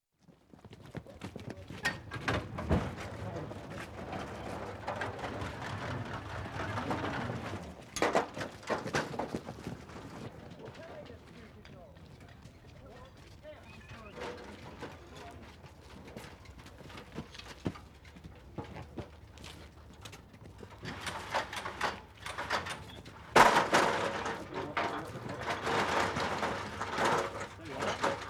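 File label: Hoses Run Out And Ladders Erected, Emergency; BBC Sound Effects Library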